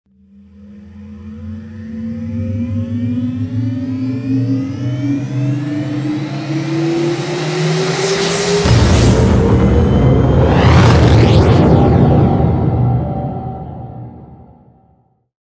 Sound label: other launch, ships